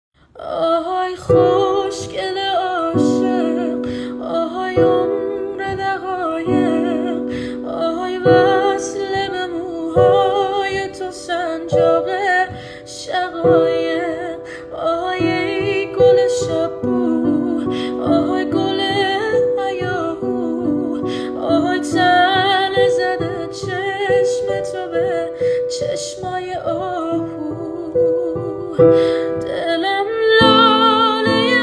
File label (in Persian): Ahay Khoshgele Ashegh ba sedaye zan; صدای زن